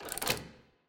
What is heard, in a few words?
Lock Dial Down; Puzzle